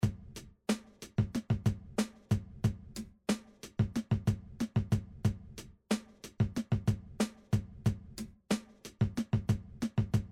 Loop

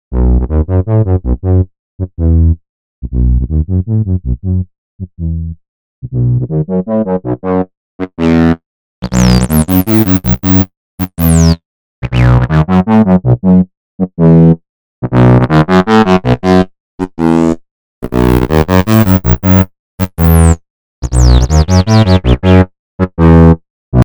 Vacuum Moogish

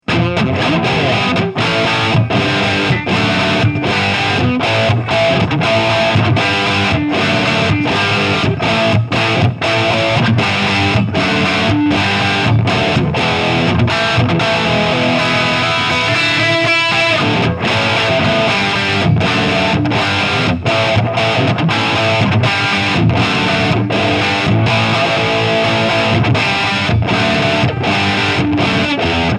Red Channel; Metropoulos

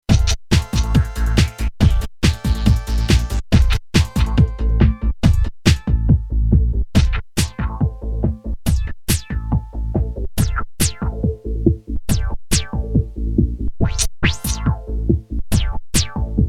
Filter In

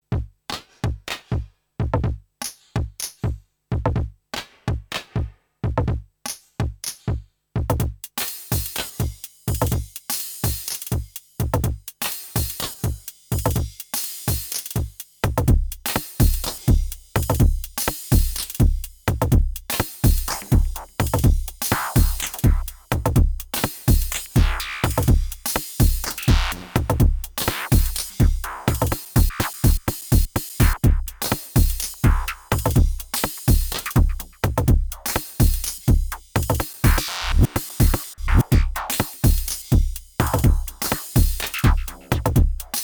Roland TR 8S Pattern

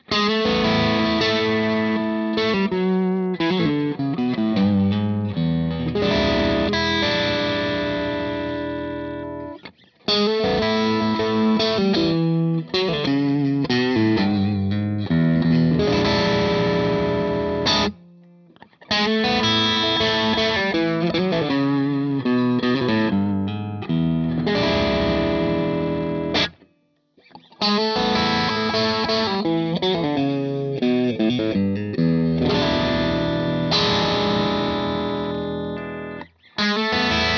Fender strat 65 OD bluesy

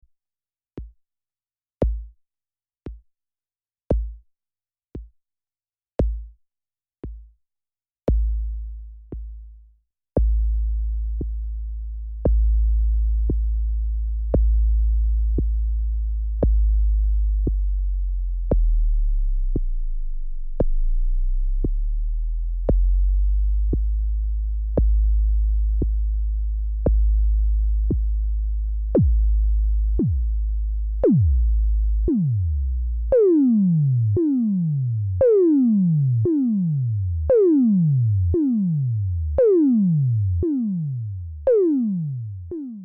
BD Tom Cat

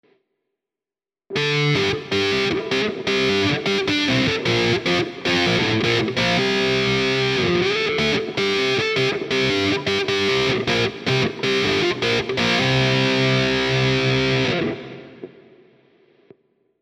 Amplitube4 Soldano Fixed Wah; irig Micro Amp

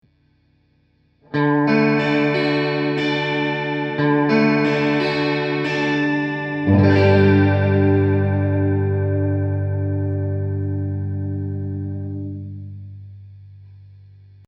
TC1210 Xflanger Fast

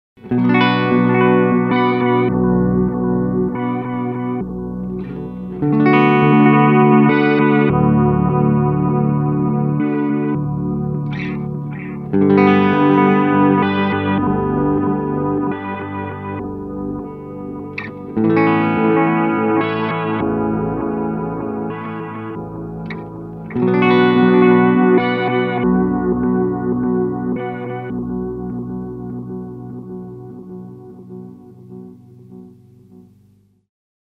Eventide H90 Sound Bouquet mit Pitch

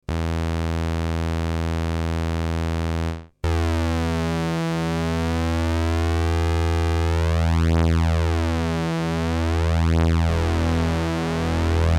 OB VCO Saw Pulse

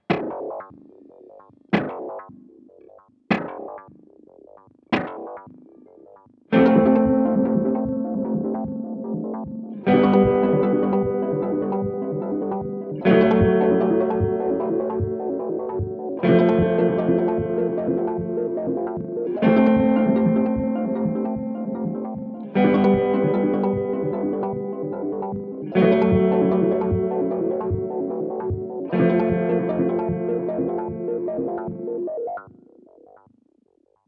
Beetronics Seabee; Factory Preset

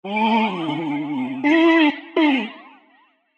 Vocals

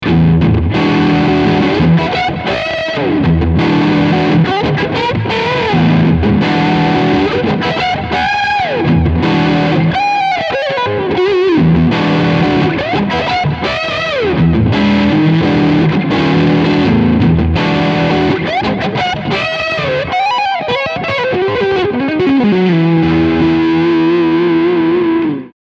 Middle SC Overdrive

